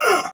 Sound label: Pain Soldier Sword Agent Male, Battle; Sinecure